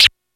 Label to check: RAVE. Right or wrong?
Right